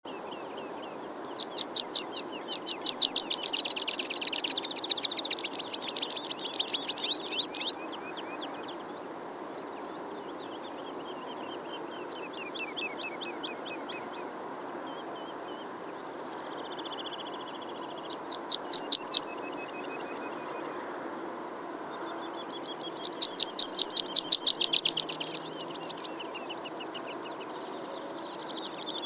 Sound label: Meadow Pipit